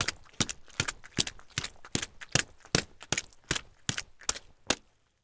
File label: Mud Water Splash; Footsteps; HauntedBloodlines; SFX Producer